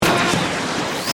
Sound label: Stinger Rewind, Production, Element, Stinger, Rewind, Whoosh; Stingers; BlastWave